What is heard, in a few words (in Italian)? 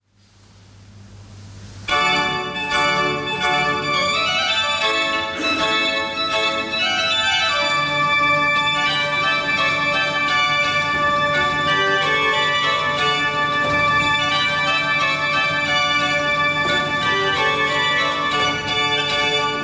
Concerto per Organo; P. Morandi